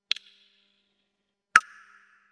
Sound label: dripping blood; Horror